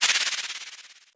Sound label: electronic, shaker